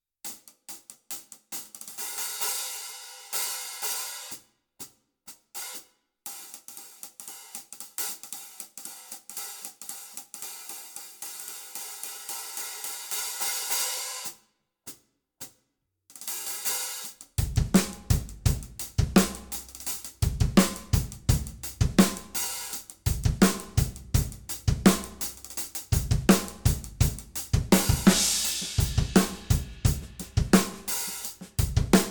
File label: Tradition HH